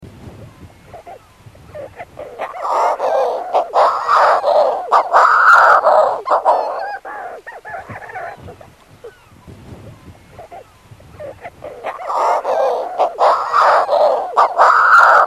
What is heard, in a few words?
shearwater call